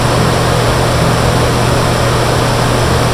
Fan, noise; Logic Pro X; Alchemy; WaveNoise

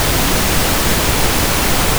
Pink, noise; Logic Pro X; Alchemy; WaveNoise

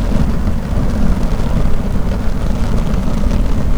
Fire, Noise; Logic Pro X; Alchemy; WaveNoise

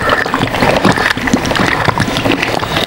Liquid, WaveNoise; Logic Pro X; Alchemy

